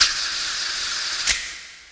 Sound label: drum